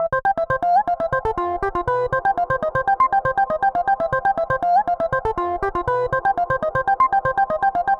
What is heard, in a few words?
Electro Lead